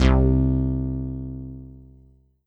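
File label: Yamaha SY22 Synth Bass C2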